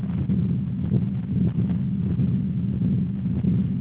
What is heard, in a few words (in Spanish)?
ducto arterioso persistente